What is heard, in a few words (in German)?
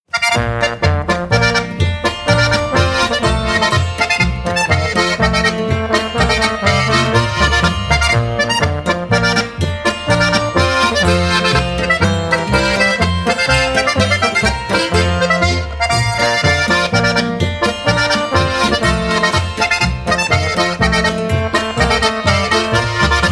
Salzberg Marsch